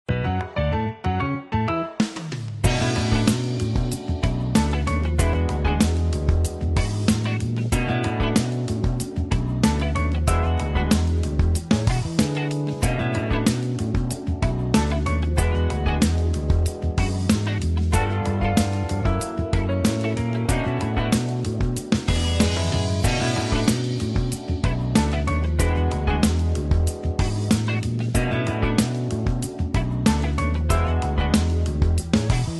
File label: Music; Rhythm Heaven Megamix